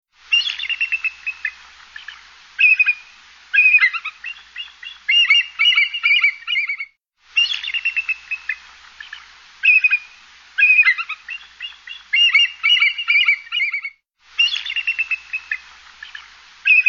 Bald Eagle

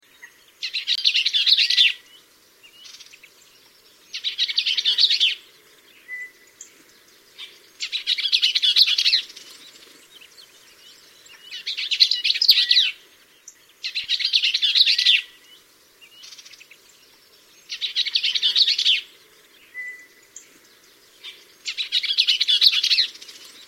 Bells Vireo; Kevin J Colver

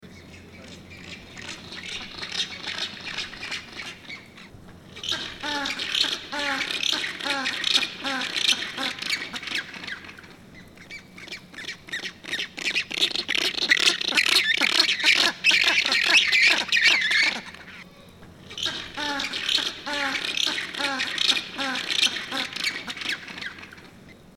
Rockhopper Penguin; Henry Doorly Zoo

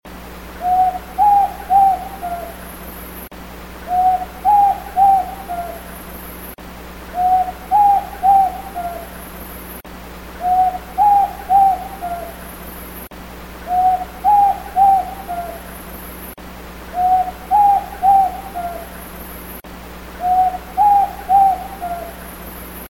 Bare Shanked Screech Owl; Gerardo Obando

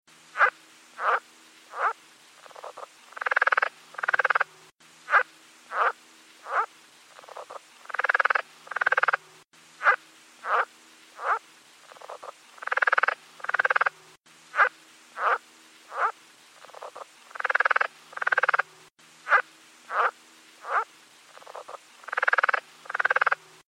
Rio Grande Leopard Frog; Davidson; Rorabaugh

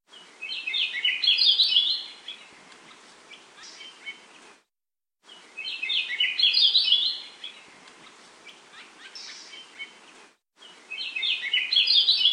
Akiapolaau; Hawaiian

